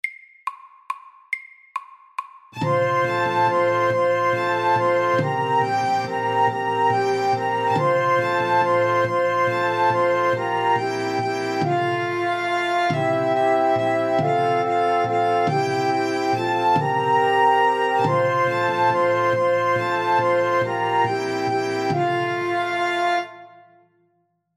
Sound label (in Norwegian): Irsk melodi